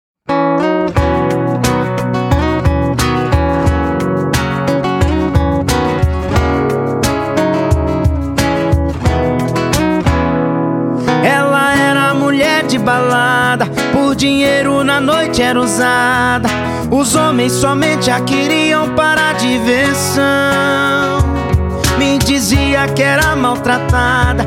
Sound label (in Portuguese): musica alice bebe